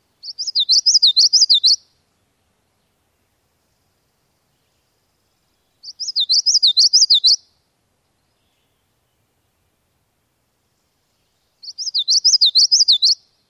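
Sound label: Common Yellowthroat songs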